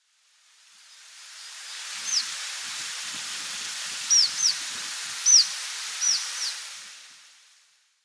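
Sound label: red-throated pipit, kin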